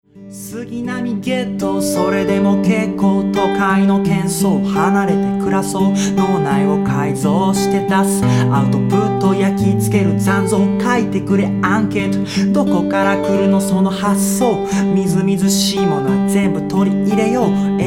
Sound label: music